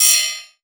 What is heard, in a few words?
SOUND EFFECT, Metal; AKAI S6000 CD-ROM - Volume 1